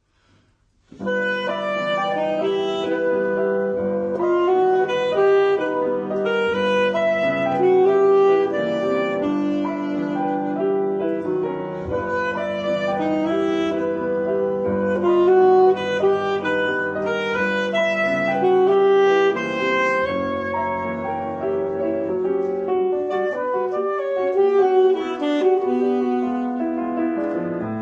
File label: Saxophony